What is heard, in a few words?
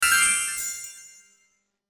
collect